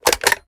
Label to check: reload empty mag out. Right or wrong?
Right